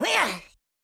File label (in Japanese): 攻撃